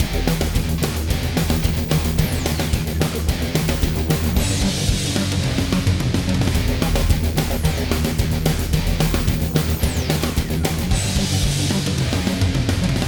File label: Metal Arcade Loop